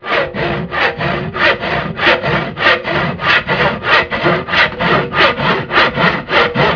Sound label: sawing